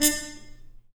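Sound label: brass trumpet mute stab, Harmon stabs; Harmon Amb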